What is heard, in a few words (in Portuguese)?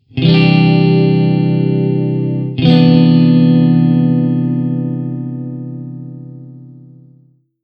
Campo Maior Acordes C9 C7M9